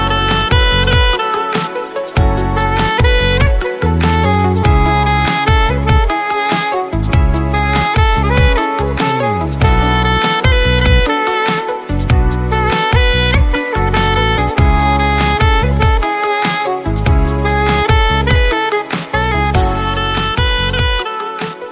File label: Ringtone Mobile